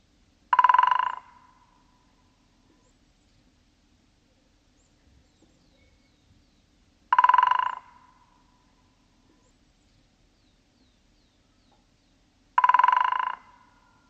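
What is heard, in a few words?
great spotted woodpecker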